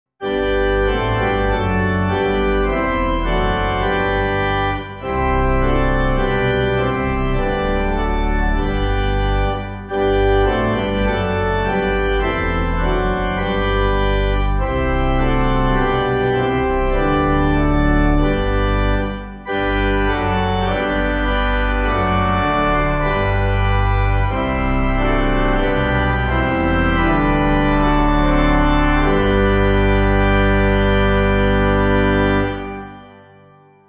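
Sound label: Pipe, hymn backing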